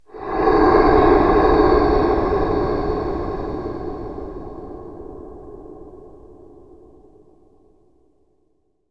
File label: BREATH OF DEATH CAST